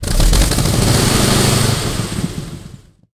sherman m4a1 engine load, tanks; engines; War Thunder

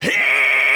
zip kick start

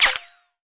bullet hit inside; War Thunder

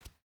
footstep boots cloth jump start; Rust

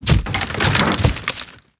pole laying; World of Tanks Blitz

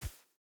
wolf footstep sand hard; Rust

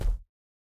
bear footstep concrete hard; Rust

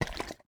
bota bag slosh fast; Rust

